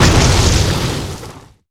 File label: Down Water; kids park kaitou sentai lupinranger vs keisatsu sentai patoranger